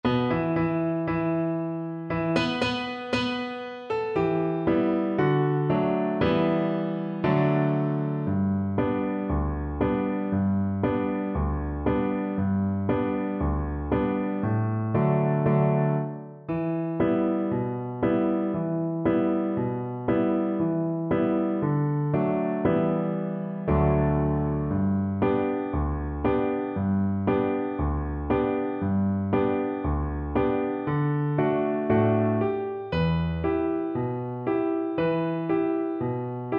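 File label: VLC karaoke, Cello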